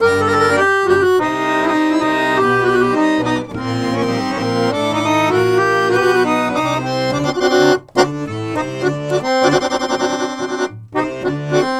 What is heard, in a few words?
Balkanish Traditions; USB Soundscan vol.63; AKAI